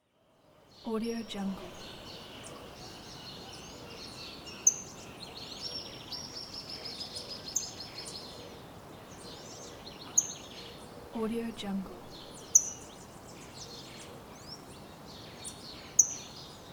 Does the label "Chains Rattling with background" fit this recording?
No